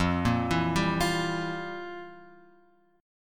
F Major 7th Suspended 2nd Suspended 4th, F, guitar; FM7sus2sus4 Chord {1 1 2 0 X 1} arpeggiated